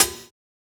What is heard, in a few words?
Closed Hat, JUNGLE BREAKS; ORIGINAL JUNGLE BREAKS